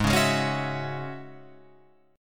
Ab 7th Suspended 4th Sharp 5th Ab down Guitar Open F; Ab7sus4#5 strummed